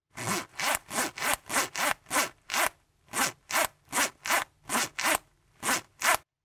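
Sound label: zipper fastener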